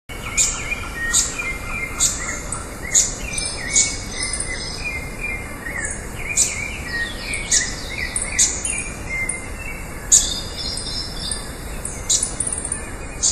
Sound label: Turdus subalaris; PP Urugua-i; Jorge La Grotteria